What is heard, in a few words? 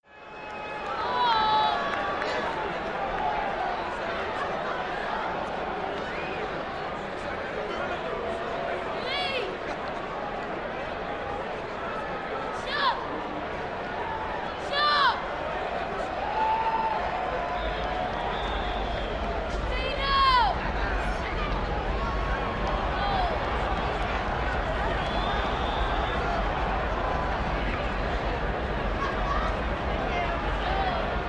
Arena Crowd Bullpen, sports arena